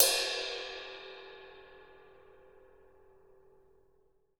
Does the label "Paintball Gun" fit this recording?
No